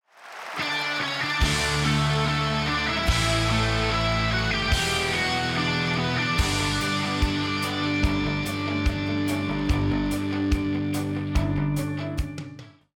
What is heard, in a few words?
start action; music kits custom